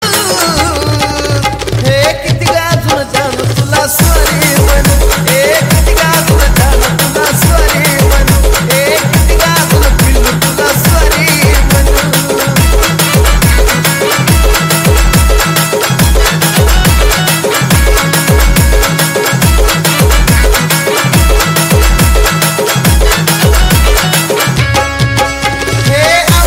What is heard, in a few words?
Marathi ringtone